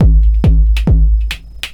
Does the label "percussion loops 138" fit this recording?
Yes